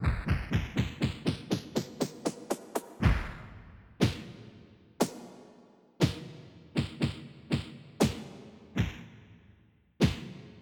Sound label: punch; Populous Demo; POPFIGHT